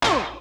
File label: Uhh, Yamaha DD-35